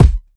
smooth kick drum sample C key, kick drum tuned to the C note